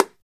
LT BGO TIP, BONGOS; Drums In Your FACE!

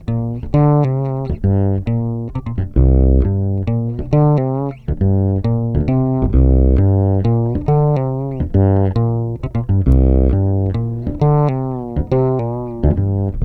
WALK, Bass Grooves; USB Soundscan vol.30; AKAI

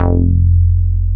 SH101; OLD ROLNDS

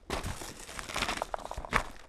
Rocky Step, outdoor, Footsteps

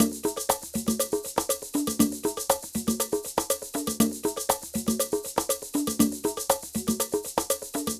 Ensemble, Latin Percussion

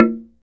HRD SLIT DR, Heart of Africa; SLIT DRM2

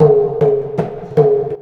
PERC LOOP, Asian Traditions; USB Soundscan vol.60; AKAI

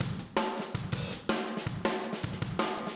Room, Drums, 162 BPM; Drums from the Big Room The Mixes; Take Your Toms Off; Verse

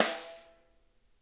rim p R, snare; Sonic Reality; Interactive Drum Kits

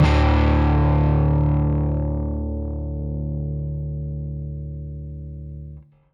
Rickenbacker Bass; Airwindows; Rick-Xtras

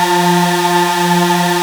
Prophet 5 F4; Hollywood Edge - Giorgio Moroder Rare Synthesizer Collection

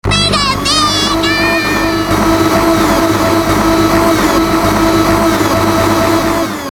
blender

